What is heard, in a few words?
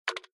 Spin Stop; Lucky Wok